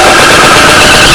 Metal; lolicore

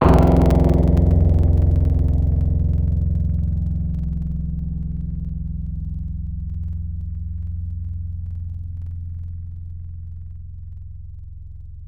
Valiha F2; Synthetic's Serum Collection; Echo Sound Works Sphere

